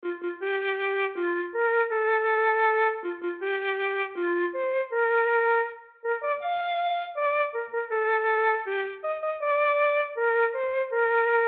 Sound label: happy birthday solo vintage